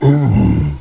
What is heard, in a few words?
Enemy Hit; gubble